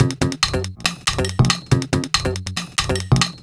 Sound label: Kartoon, Synchro Funk, Beats; Sonic Foundry (Sony Creative Software)